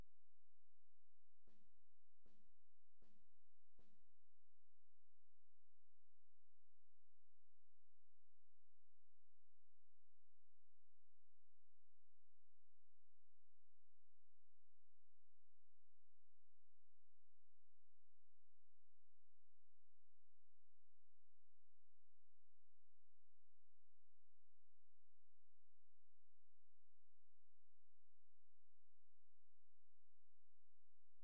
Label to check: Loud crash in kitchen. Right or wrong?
Wrong